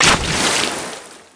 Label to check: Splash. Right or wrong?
Right